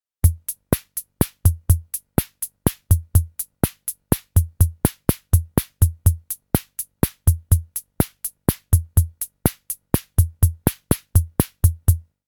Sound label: SR-88 PATTERN